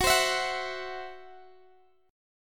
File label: C-Major Flat 5th Gb, guitar